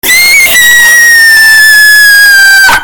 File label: very loud